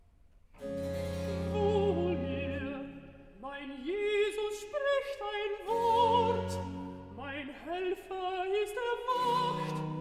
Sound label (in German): Recitativo (Alt); vocal; alto; BWV081; Wohr mir mein Jesus spricht ein Wort; BACH